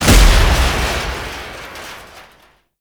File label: Red Barrel Explosion; Gameplay; Awakening Remaster